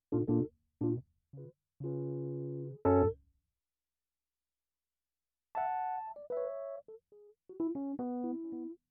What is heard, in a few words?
Keyz R